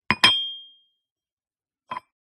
china cup clink handling set down pick up on table jingle; PMSFX